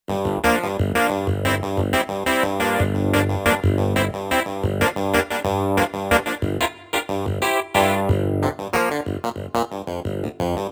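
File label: Piano Electro Clav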